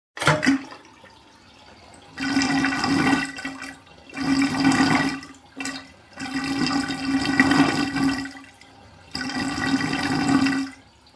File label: Water drain in the sink